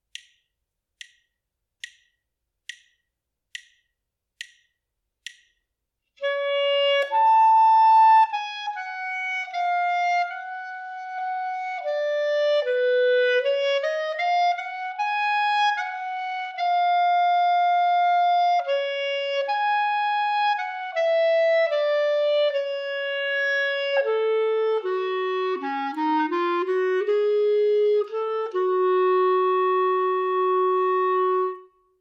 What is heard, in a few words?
melodic dictation; ear training